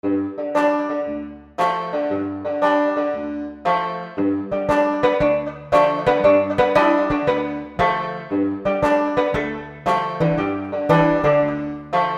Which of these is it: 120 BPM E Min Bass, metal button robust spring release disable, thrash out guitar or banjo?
banjo